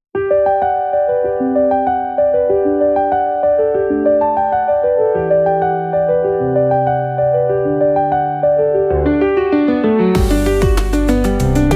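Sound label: 96 bpm Piano Arpeggio, instrumental; ai social media music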